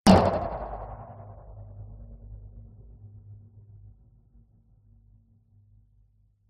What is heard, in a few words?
sound design impact metallic sharp hit droning tail; zapsplat